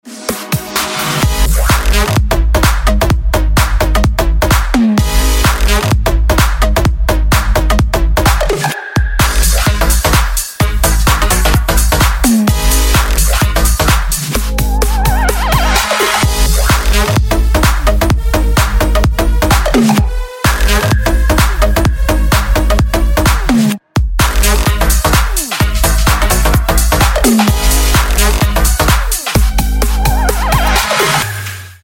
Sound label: some chords